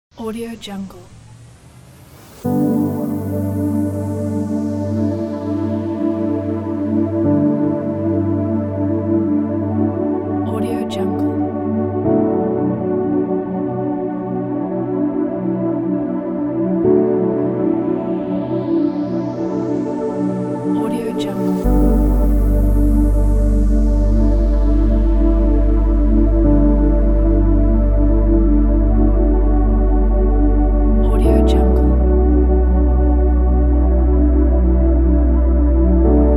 soundscape lullaby

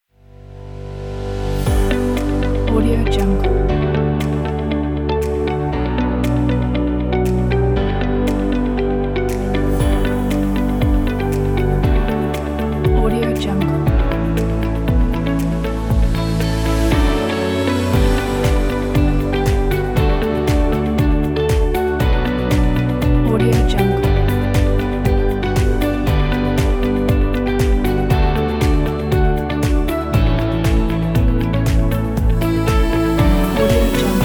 motion inspiring innovation technology

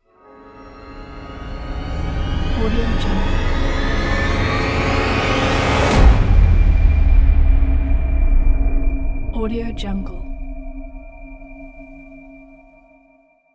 cinematic horror rise and hit

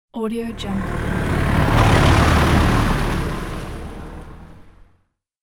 diesel close car passby in rain; fxprosound

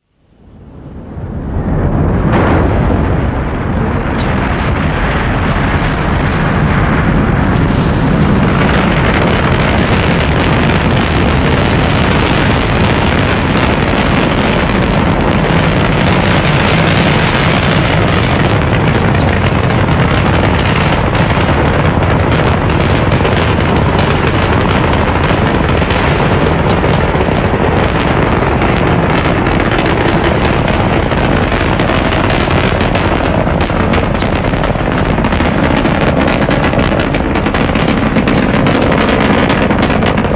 rocket launch

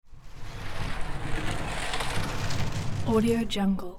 matter mayhem plastic big container dragged; soundmorph

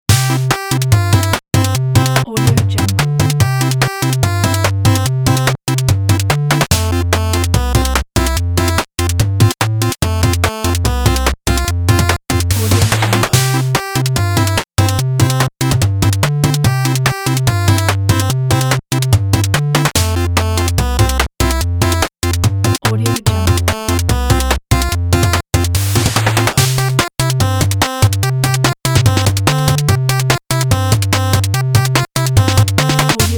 8 bit game retro

